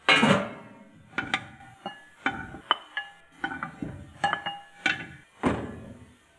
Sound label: kitch; Mafie 1